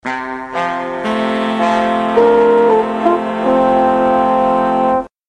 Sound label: Tv Ident; Thames